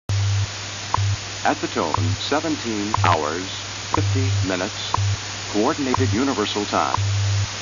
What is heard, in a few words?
distorted hours